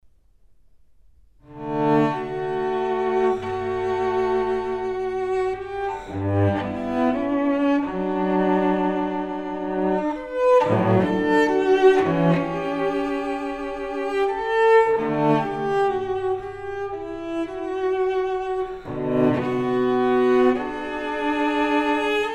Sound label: Suite for solo cello No. 6 in D m; Bach; Cello Suites